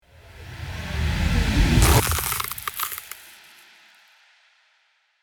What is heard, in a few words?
Cinematic ice freezing transition; Trailer Sound Effects